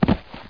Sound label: paw land; TNP demo